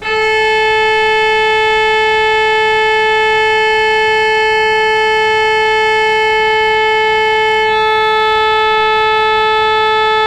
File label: CHANT PISTON, jazzband limonaire